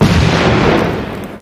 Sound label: explode